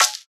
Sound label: Perc; Percussion